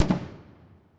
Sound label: firework; gmod; zerolib